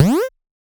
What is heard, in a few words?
jump; A Cat Game; Monarkie Digital